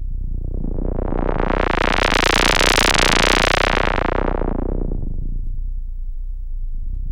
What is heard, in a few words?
low sweep, rattlesweeps; Zero-G Ambient 1